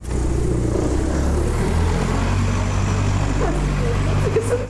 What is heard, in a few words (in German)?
Vorbeifahrendes Auto